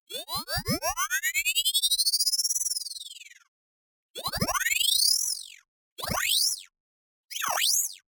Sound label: Short Power Up; Doex Studio; 90s Anime SFX Pack